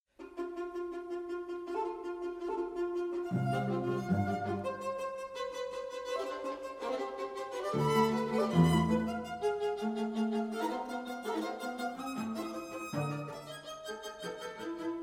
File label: String quartet, Allegro assai